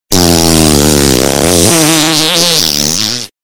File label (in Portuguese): som de peido alto e longo